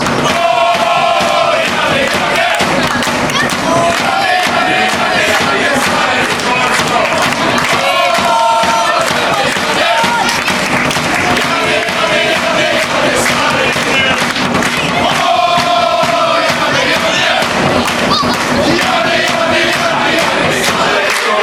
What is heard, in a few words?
chant, fanchants; hacken